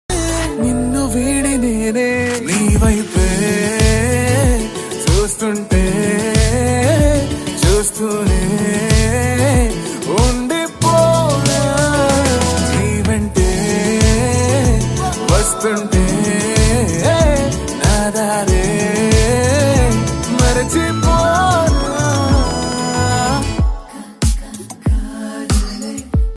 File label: song music love dance